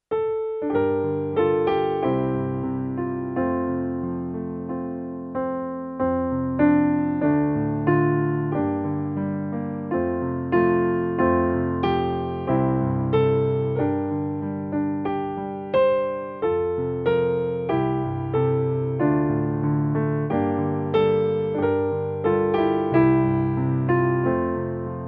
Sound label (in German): Playback F-Dur